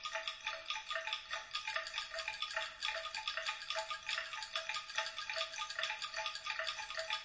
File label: bgm passage